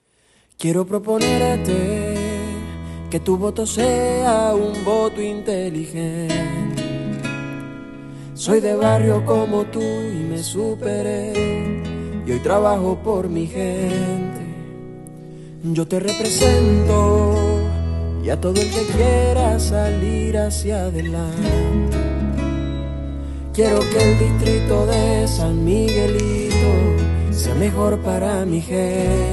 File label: jingle